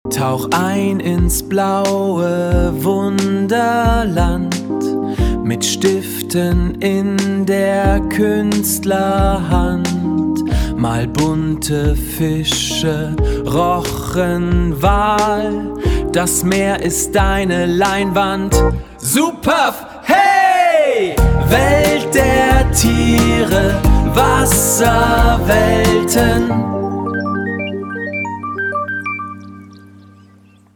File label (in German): Wasserwelten jingle; der Tiere Band II Wasserwelten